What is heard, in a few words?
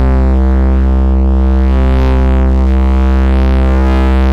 SYN BASS